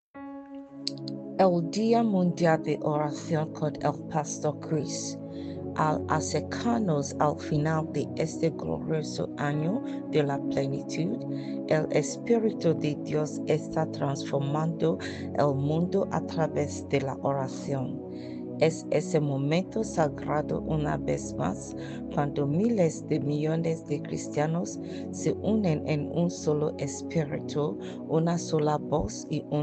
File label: SPANISH JINGLE